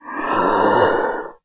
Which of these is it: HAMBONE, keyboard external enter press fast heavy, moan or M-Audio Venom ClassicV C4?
moan